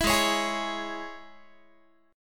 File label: Ab Suspended 4th Sharp 5th Guitar; strummed; Absus4#5; 19,16,16,18,17,x